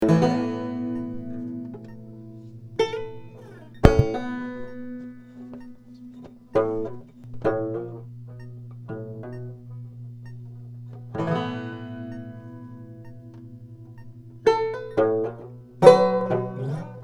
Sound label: banjo test